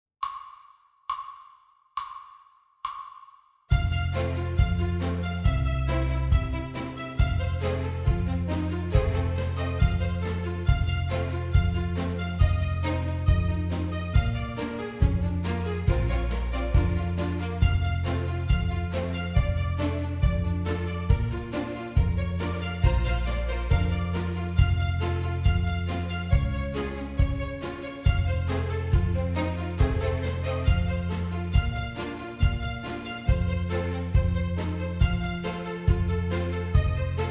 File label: Interval Study